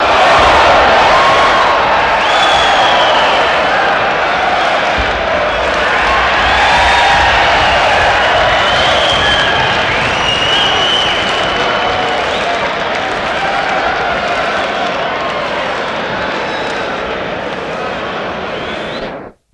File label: Crowd Cheer Indoor, big arena